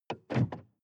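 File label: sports car interior trunk open soft; verkehr transport; autos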